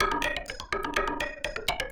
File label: PERCLOOP INDST 125, Industrial Loop